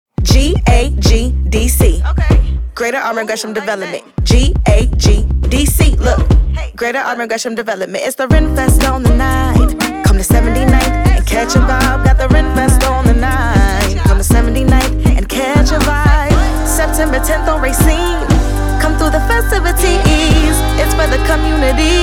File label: jingle; renfest